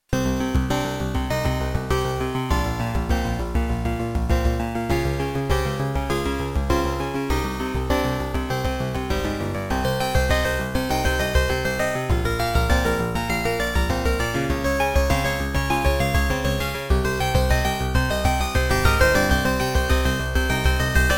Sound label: Beepblaster 2.0 BEEP MODE; Hocus Pocus